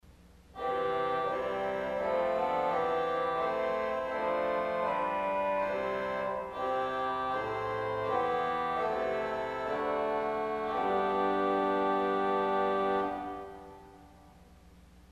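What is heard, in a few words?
English Horn St Anne, organ stop; CulverAcademy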